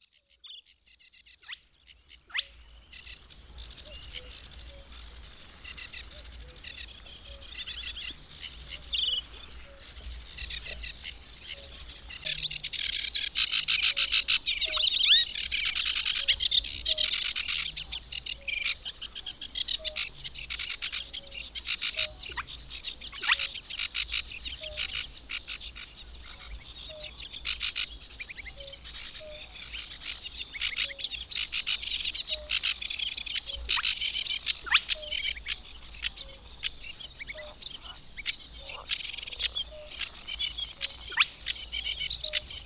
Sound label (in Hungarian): Pettyes vízicsibe; Madardalok